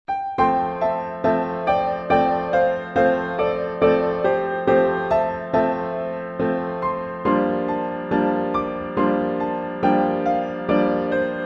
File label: piano miniature